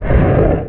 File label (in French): Grognement